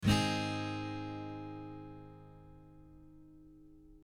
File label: g A♭1